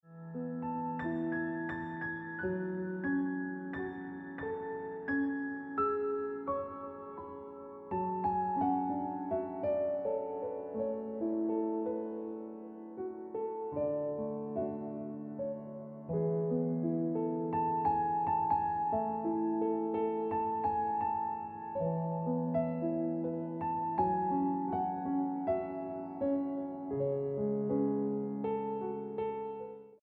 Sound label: Ringtone